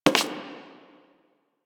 Human Loid Foley Step; Characters; Warframe

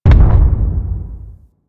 Arachnoid Camper Distant Step; Warframe; Corpus

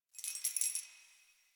Keys Jingle; Warframe; Ambience; Nineteen Ninety Nine

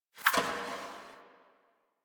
Omicrus Quick Draw Tail; Warframe; Quests; The New War; Weapons

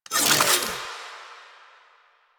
Finisher Parazon Unequip; Kuva Lich; Warframe; Finishers

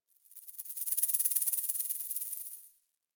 Forest Creature Rattle High; Warframe; Grineer Forest

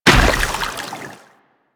Combined Step Water, Footsteps; Enemies; Warframe

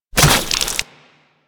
Hook Tongue Spit; Attacks; Warframe; Grineer Ghouls

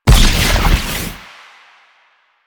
Explosive Impact; Warframe; Akarius Prime

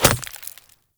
Throwing Knives Impact Vegetation; Weapon Impacts; Player; Environment

